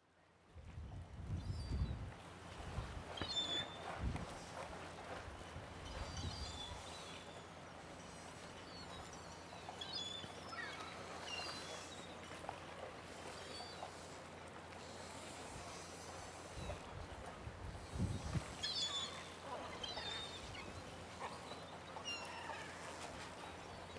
warnemunde hafengerausche

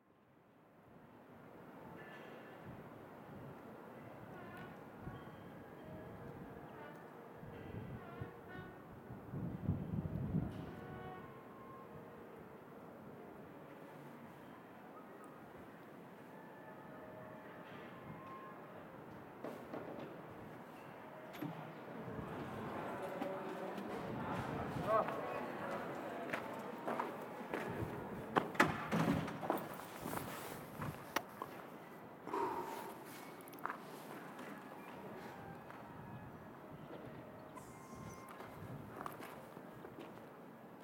innenhof

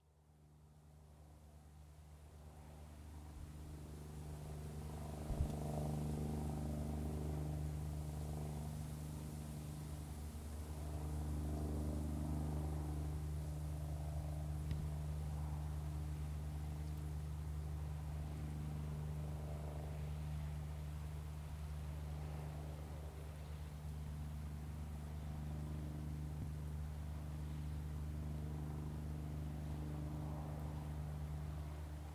uferkante warnow gross klein sportplatz schilf

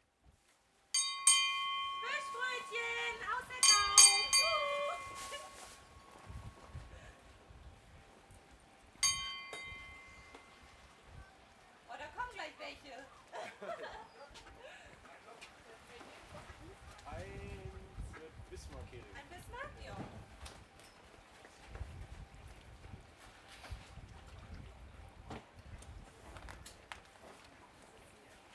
warnemunde kutter

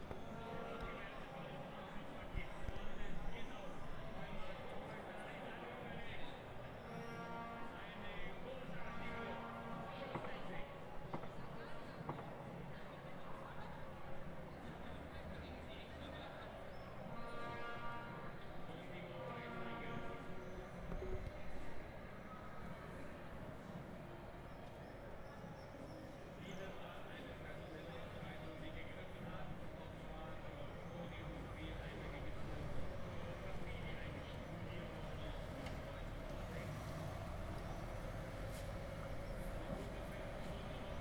fussballem

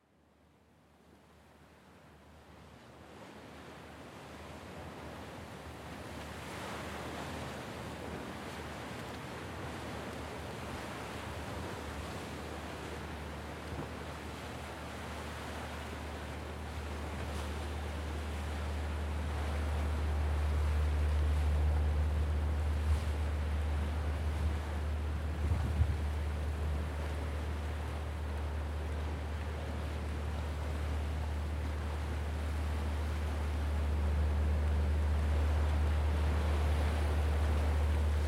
shuttlefaehre zu hohe duehne; warnemuende

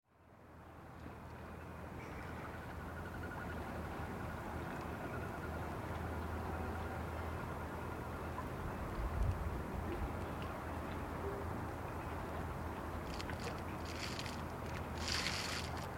iga gelande brucke warnow

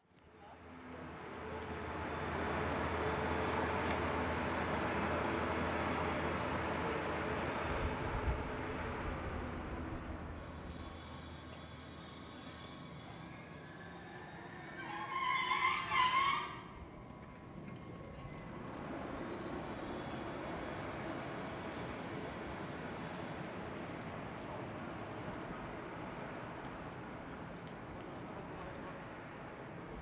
bahnhof; warnemunde

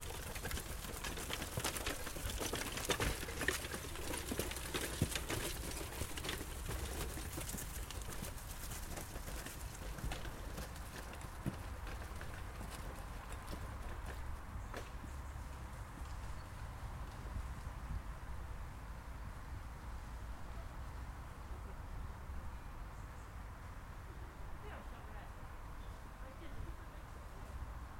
rosengarten long

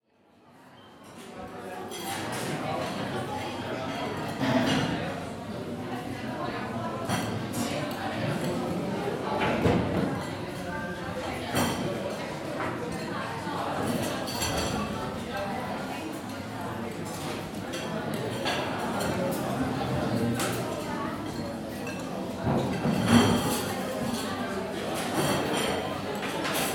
Mensa, Rostock

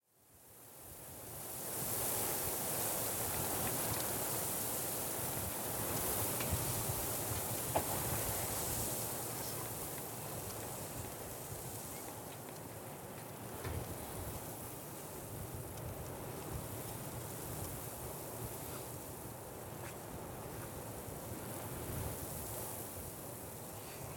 warnemunde sand durch strandgraser

